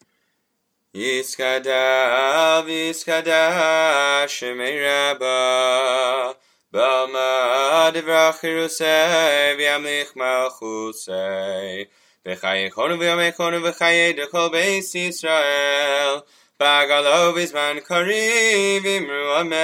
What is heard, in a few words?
Kaddish; Musaf